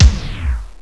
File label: click